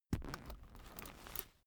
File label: umbrella telescopic close slow